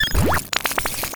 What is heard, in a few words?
specialmission stealth loop